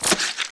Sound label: airboat leech die